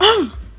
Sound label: pain; arachna